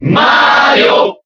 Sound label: Cheer Spanish; Mario; SSBB